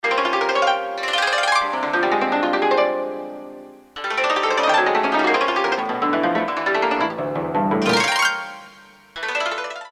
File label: KOTO UP, Korg DSS-1